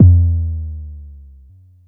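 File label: Long bass drum, Jomox Airbase99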